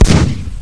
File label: barrel; weapons; unreal; quad